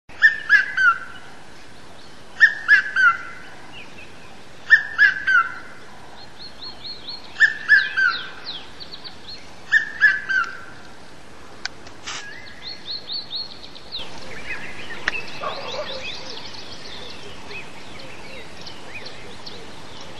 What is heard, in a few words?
Piet-my-Vrou and Dawn Chorus